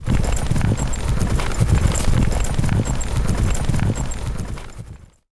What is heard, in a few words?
Horse Loop; warcraft3; knight